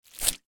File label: horror slit throat or flesh knife wet squelchy; sound effects one